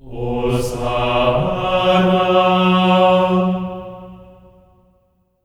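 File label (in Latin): hosanna B3; Spectrasonics; Symphony of Voices; GR HOSANA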